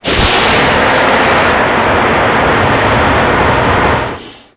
NOS boost, Cars; XBOX

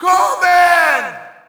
Shout, audience; UFC Tapout 2

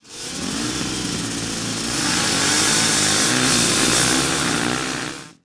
start

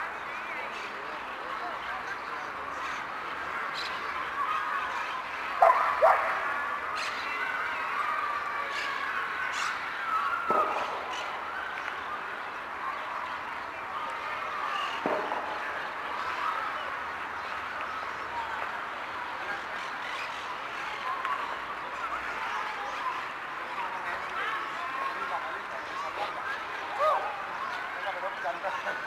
Barce Walla; ambience; Project Gotham Racing 2

